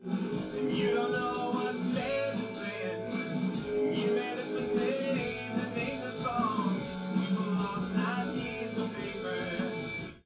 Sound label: wdrums 380ms 20cm, underdetermined; SiSEC11